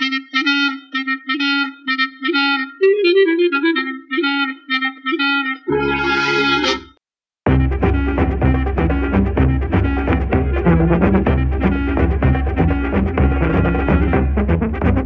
sax belly dancer, Loops; Phonky

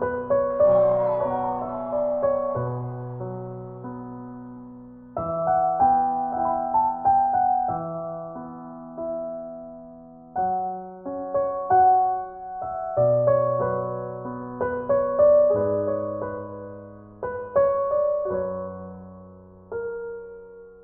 piano, Sad

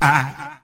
"ah"

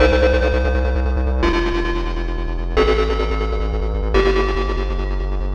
bittune, Electronic Loops

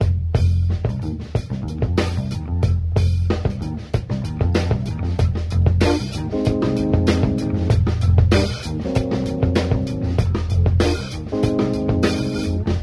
full bass and sax and keys; Loops; Phonky

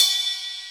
Cymbals Normal

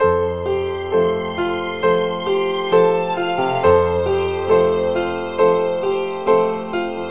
piano and strings, Loops Sad

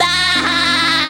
dah ha, Voice; ooh ahh

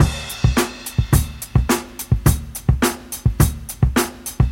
Drum loops, Boombap

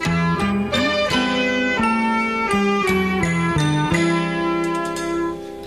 horns, phonky loops

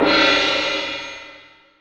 Cymbals

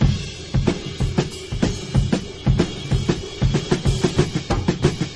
fill it in, funky drum loops

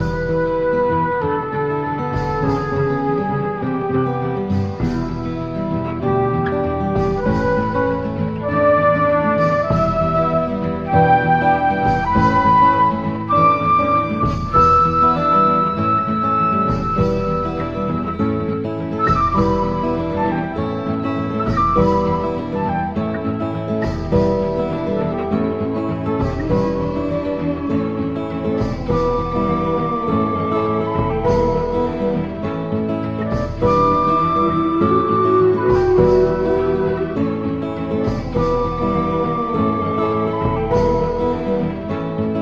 flute and guitar, Loops

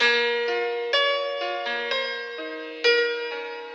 piano, sad; loops

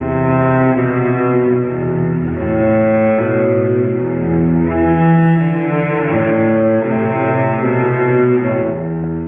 strings shivers, Sad; Loops

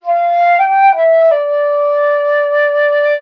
flute introduction, Loops

